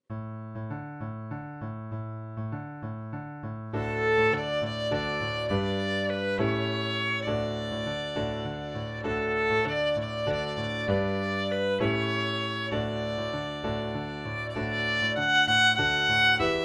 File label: Violin